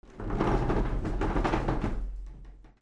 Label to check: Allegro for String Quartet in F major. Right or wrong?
Wrong